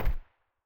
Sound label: sa58 silencer mono far